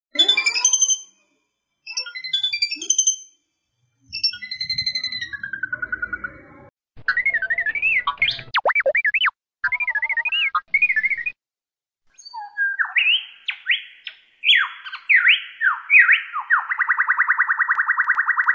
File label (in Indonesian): jalak suren isian variasi